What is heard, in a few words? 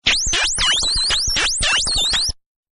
woodpecker, Button; Neo Woodpecker; Button Sound Effects; Multimedia